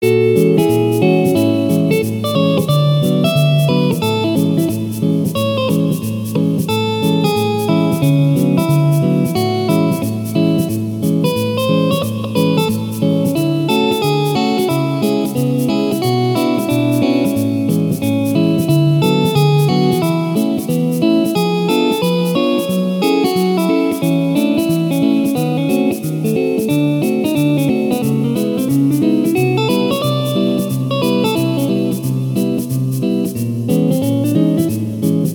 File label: Elevator Music